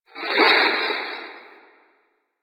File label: Video Stop; Warframe; NineteenNinetyNine; Stage6TheCatCameBack